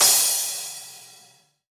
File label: Crash, Cymbals; Vengeance Samples; VEH3 Cymbals